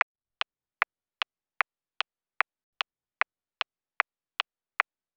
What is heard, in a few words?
Turn Signal Hazard